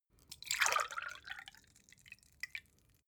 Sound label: glass pour soft; soundpool